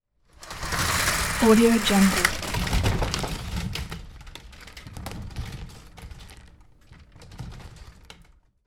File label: window blinds pulling up